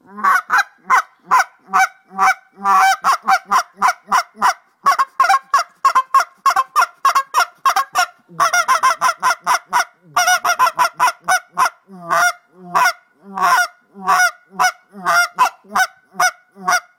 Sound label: Comatose Goose Call